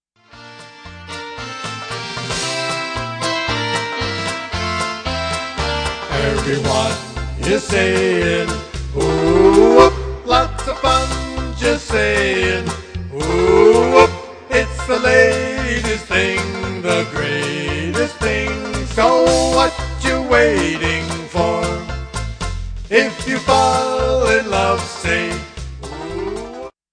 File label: Whoop